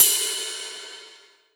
Ride, Cymbals

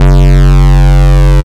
Bass Shot F#

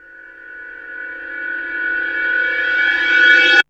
Sliding Along Me Pipe; Fx; Loopmasters; Jon Carter & Alex Blanco; Block Party Essentials

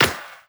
Claps & Snares

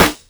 Dirty Dutch Percussive; Mutekki Media; Vengeance Electroshock Vol.2; Dirty Dutch Special; Dirty Dutch Percussion

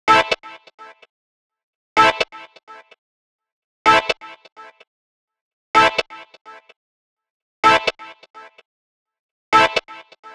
127 G Bass, Complex Electro Synths

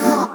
D STAB, Funky Underground

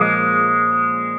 Bb STAB; Loopmasters; Funky Underground Vol 2

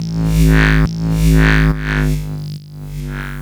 140 C BASSLINE, Dubstep

